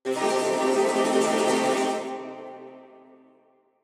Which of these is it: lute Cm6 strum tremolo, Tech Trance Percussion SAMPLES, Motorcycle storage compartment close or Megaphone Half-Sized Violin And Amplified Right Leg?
lute Cm6 strum tremolo